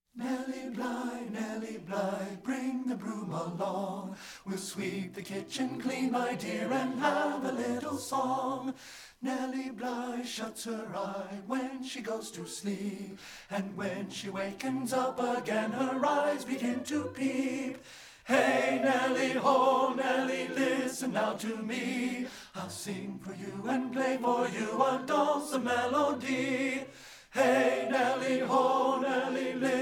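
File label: madrigals, tenor; STEPHEN FOSTER MADRIGALS 2; partbypart; satb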